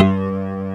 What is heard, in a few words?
SYNCLAV; East West; Global Sounds